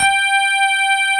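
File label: JV electric piano; Pro-Rec - Synthsonic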